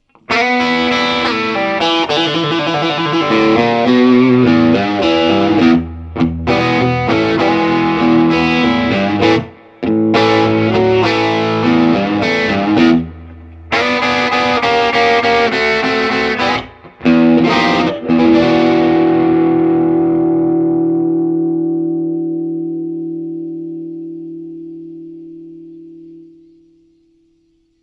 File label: Distortion Dirty